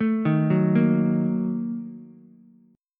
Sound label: A sharp Major 7th Suspended 4th Sharp 5th, down, Ukulele; arpeggiated; JGuitar